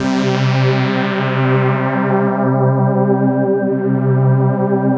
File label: SYN K-2000, Roland